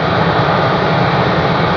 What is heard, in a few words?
air conditioner; SpecOps2 MPlayer Demo